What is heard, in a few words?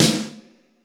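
GATE SNARE; Crypton - DrumBase5000; GATE KITS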